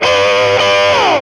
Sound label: GTR OD CHR, E.Guitar FX